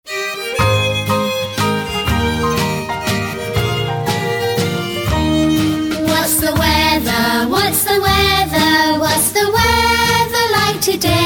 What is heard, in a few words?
karaoke